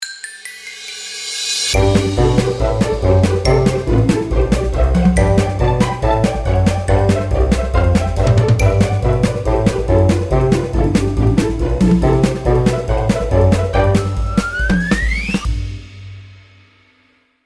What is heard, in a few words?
emoji jingle